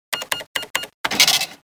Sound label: cash